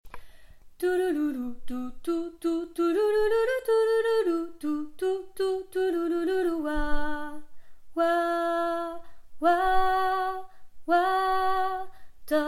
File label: wawawawa Alto